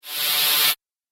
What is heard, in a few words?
Whoosh Tunnel; Whoosh Sound Effects; sound design